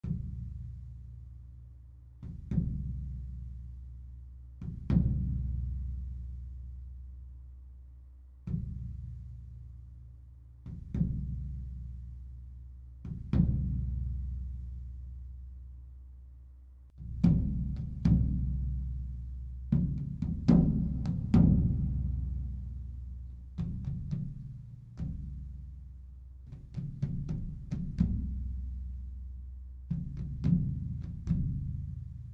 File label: Ambient